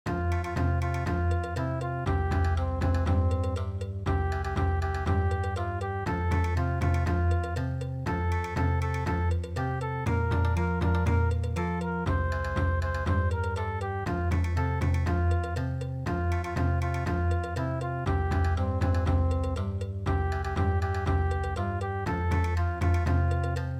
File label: German Festival Bon Odori